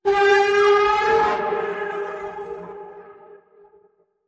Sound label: Lycan Growl